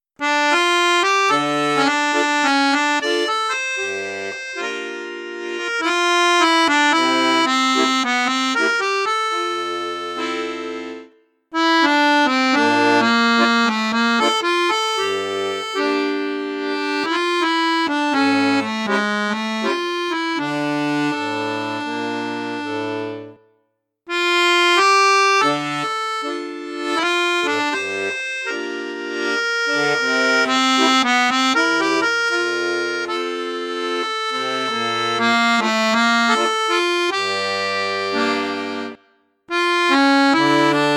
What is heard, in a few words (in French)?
registre flute juste, accordeon; piermaria prodige